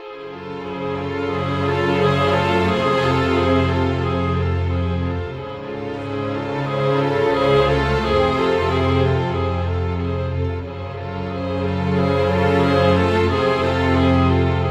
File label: Cinematic Strings, Orchestral